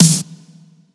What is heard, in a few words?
soft hit clap; osu